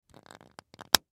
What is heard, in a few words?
Toy Prize Plastic, Foley Toy Prize Plastic Bubble Package Lid Creak Close; Vending Machine; Blastwave